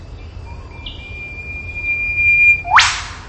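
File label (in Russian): не похожее на других пение птицы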